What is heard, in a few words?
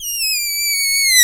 balloon, percussion